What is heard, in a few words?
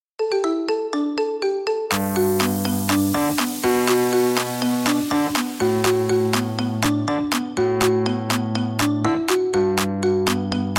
marimba remix